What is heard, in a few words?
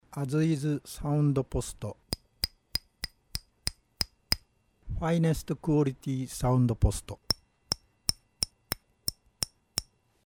soundpost tapping, cello